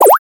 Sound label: success; bubble